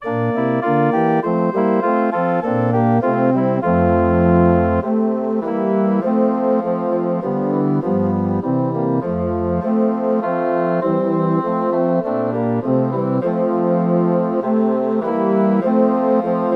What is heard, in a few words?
Tenoroon Track with Melody